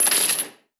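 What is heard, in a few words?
chain; KUSARI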